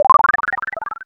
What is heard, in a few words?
heal